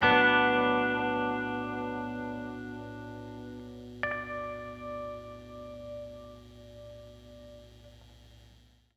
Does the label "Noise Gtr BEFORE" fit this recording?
Yes